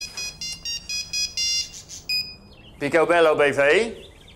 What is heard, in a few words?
Ring; Picobello BV